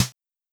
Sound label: Snare, Fricke MFB 502